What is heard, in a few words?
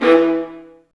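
Marcato, Strings; Vlas Marcato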